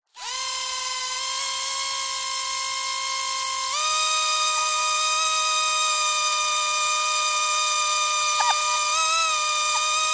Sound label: drone micro motor whirr burst movement; vehicles